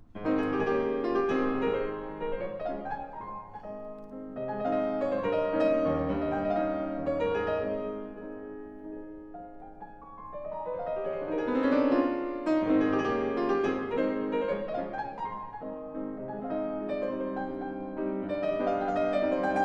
Trio for Piano, Violin and Cello in A minor, Tempo di Mazurka; Deutsche Grammophon